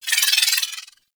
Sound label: Pistol Proj Whiz, weapons; Warframe; Thanotech